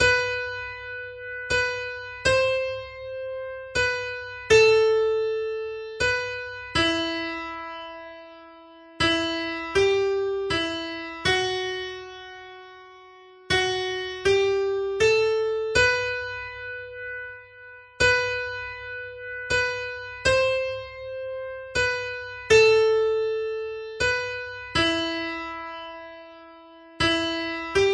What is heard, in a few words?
slow valse musette